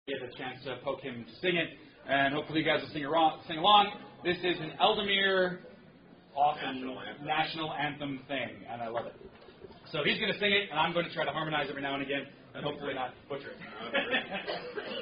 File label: Song; SCA Song